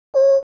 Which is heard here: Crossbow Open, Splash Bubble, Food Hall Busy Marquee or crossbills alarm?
crossbills alarm